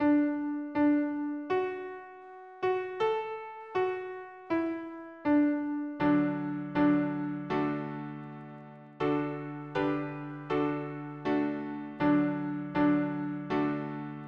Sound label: harmony piano